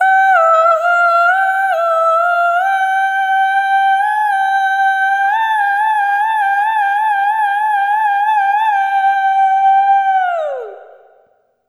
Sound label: Arrythmic Atonal; Sonic Foundry (Sony Creative Software); ILONA